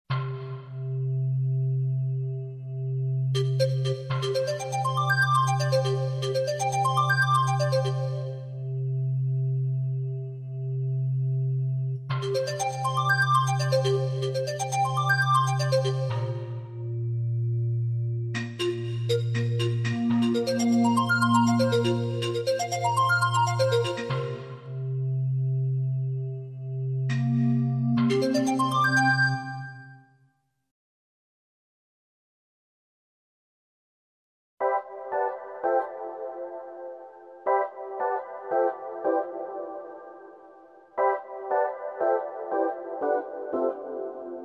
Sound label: Rhythm